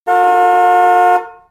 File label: horn; kartsounds